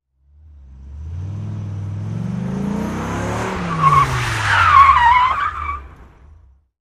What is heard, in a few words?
Accelerate Quickly, sports car